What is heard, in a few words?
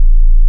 28Hz